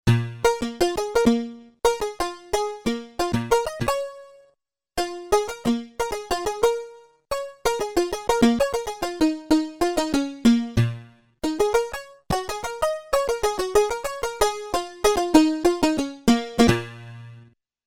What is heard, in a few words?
syamisen, Korg DW-8000; Factory Patches